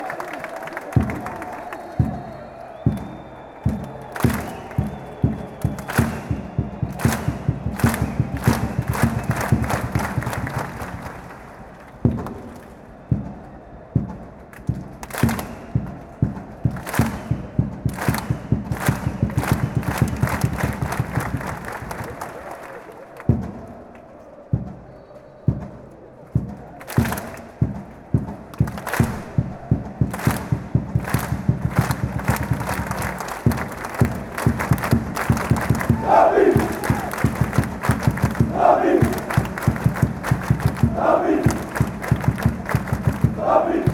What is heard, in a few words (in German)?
rapid klatscht, fanchants